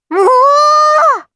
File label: combo burst; osu!; Night-Gre3n